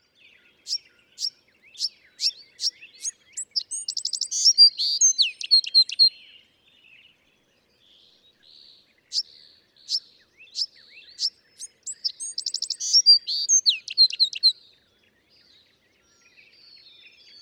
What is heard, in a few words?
Bewick's Wren